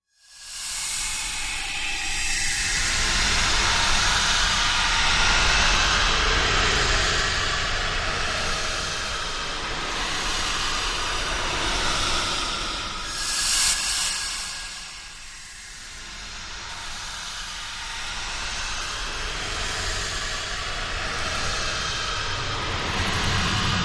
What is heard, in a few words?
Arena Panic Airy Dist Sl, horror